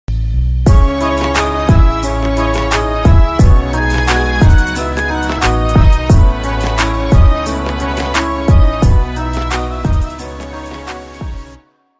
punjabi instrumental ringtone sad ringtone instrumental